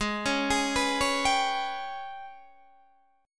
Ab Minor Major 7th Double Flat 5th, down, Guitar, DADGAD